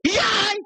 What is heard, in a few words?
yii